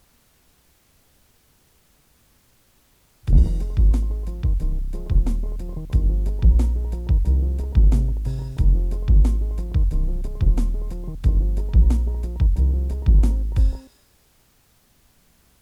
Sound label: boss drum machine beat